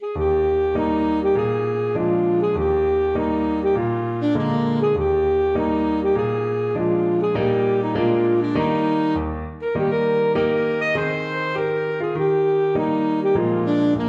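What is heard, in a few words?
alto saxophone; keelrow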